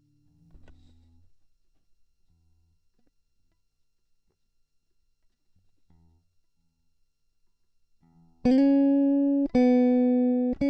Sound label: New Wave Chorus